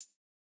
hihat; Reference Beat BPM-130; Pophits

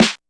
swing snare; Hiphop

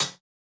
Electro hihat; Reference Beat

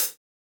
Hiphop hihat

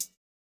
90s hiphop hihat; Reference beat bpm90

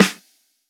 hiphop snare, Effect on

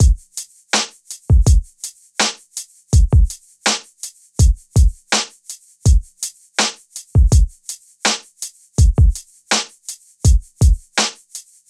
swing loop; Hiphop

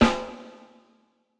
hall resonance snare

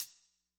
hihat; pasific; Pop

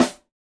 snare samples, Rock

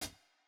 Jazz hop hihat; Hiphop

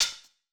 Modern open hat, Electro

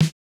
snare, snare house

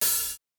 hihat, house

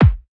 kick, house

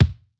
Hiphop kick; Reverb+EQ+Comp+Limit

